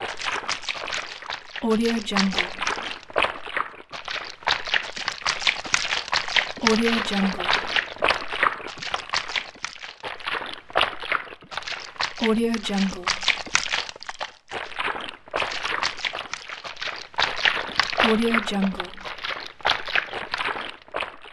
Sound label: sloshing in mud; marbury media